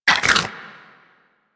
Vomit Gun Frenzy Deactivate, Weapons, Infested; Warframe

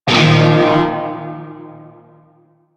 Shawzin Blues Chord I; Music; Warframe; Narmer Shawzin

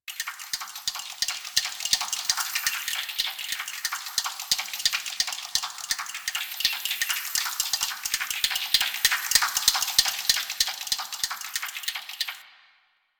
Clock Loop; Ambience; Golden Maw; Warframe; The War Within

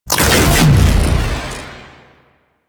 Omicrus Proj Perfect Shot Layer; Warframe; Quests; The New War; Weapons

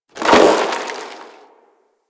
Worker Ice Lever Pull; ambience; props; one offs; Warframe; Venus Open World; Venus Solaris United

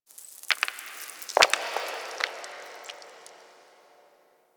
Moon Dust Fall Small, Ambience; Warframe; Orokin

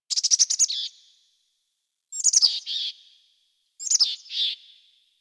Zone Tree Critters, Ambience Plains

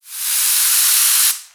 Ocean Steam Blast; Warframe; Grineer Ocean

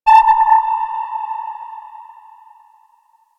Space Satellite Sonar Ping; Grineer; Warframe; Ambience; Space Battles

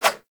Spheres Throw Whoosh; DE; Warframe; Weapons; Solstice Spheres

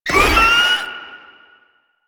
Proto Infested Maggot Attack, Enemies, Vocals; Warframe

